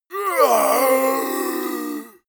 monster growl hoarse; koerpergeraeusche; comedy-cartoon; soundpool

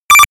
notification bleep

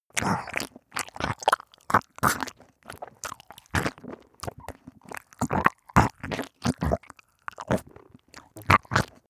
death burble long; koerpergeraeusche; menschen; soundpool

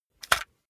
cassette grab; objekte; kassette

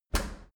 dry cleaner open, maschinen tueren

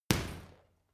boxing punching bag hit hard; sport-freizeit; boxen

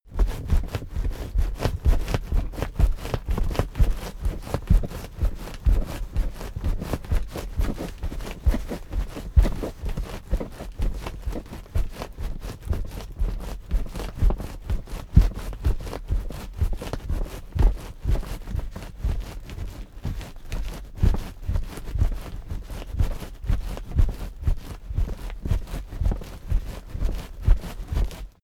cardboard box movement carry; objekte

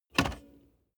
car bmw e46 interior seat adjustment lever short

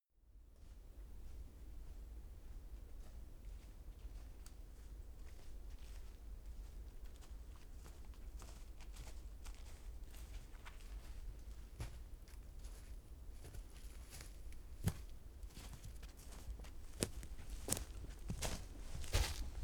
step forest pass walk

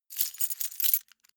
key ring large rattle; objekte; schluessel

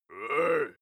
male shout hit weak; menschen; koerpergeraeusche

